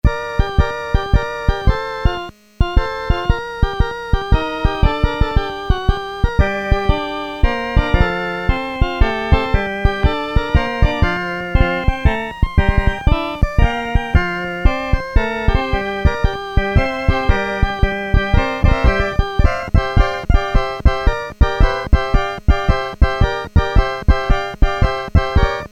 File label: harpsichord, Casio SK-1; Harmonic component sound